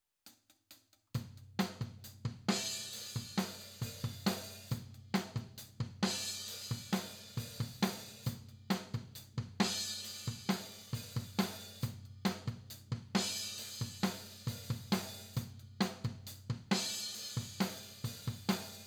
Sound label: Mid Tom